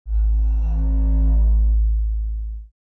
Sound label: must destroy sub bass; Basses